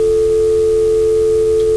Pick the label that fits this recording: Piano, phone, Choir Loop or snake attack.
phone